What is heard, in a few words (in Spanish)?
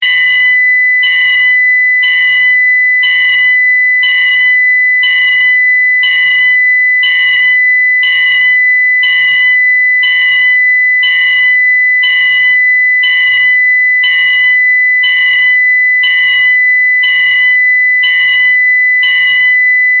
una alarma post apocalpti; apocalypse